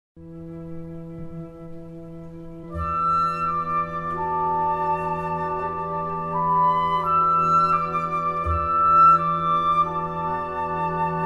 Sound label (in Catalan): Orquestra; Ravel; Auditorio De Galicia